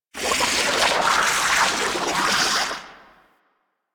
Landscape Puffer Plants; ambience; props; one offs; Warframe; Venus Open World

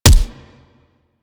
Weapon Punch Shotgun

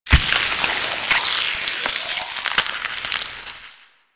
miasma impact; Warframe; Saryn

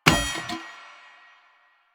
Street Sign Destroy; Warframe; Ambience; Nineteen Ninety Nine

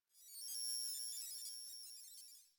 Computer Room Ambient Beeping

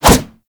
Melee Kick Whoosh

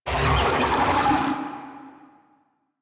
Infested Big Ashen Vocal Attack; Warframe

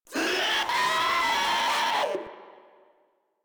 Proto Infested Runner Vocal Attack; Enemies; Vocals; Warframe

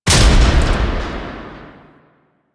Blast Door Open; Ambience; Warframe; The New War; Quests; Zariman

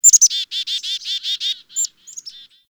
Bird Chickadee; Warframe; Ambience; Nineteen Ninety Nine

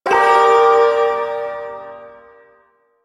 Shawzin Min Chord H; Warframe; Duviri Ersatz Shawzin

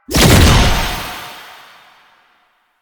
Research Pod Regenerate; JadeShadows; Ascension

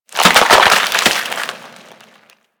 Rupture Manifest; Warframe; Warframes

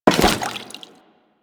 Crewman Step Water, Footsteps; Warframe; Corpus

